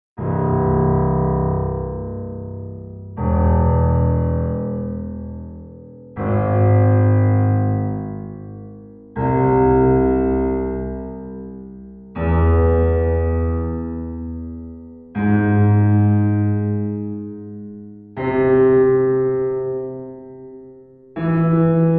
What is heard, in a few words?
pure space piano pyramid